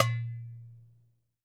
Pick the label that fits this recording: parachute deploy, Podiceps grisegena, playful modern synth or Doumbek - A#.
Doumbek - A#